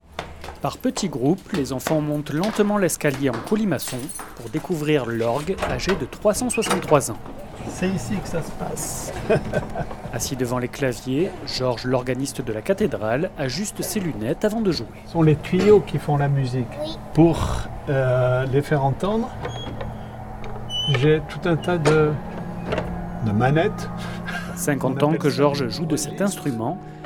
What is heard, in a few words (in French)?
orgue